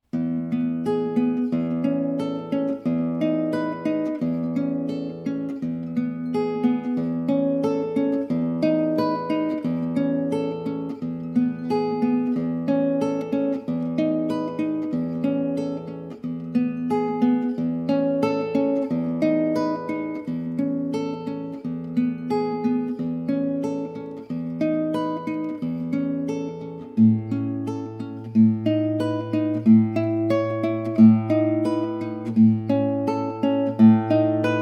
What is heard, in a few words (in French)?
instrumental